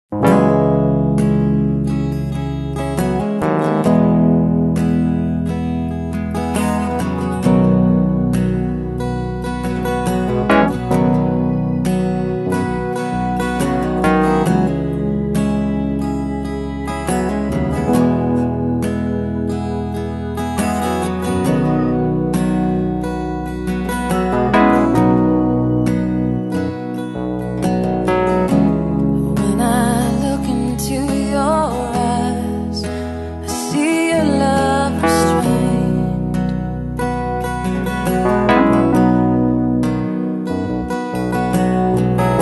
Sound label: acustico cover